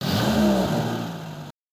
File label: Coin; drift out arcade